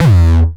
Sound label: 909 Grime E1, Kicks; Mbase 11 Kicks